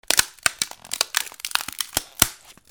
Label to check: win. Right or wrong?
Wrong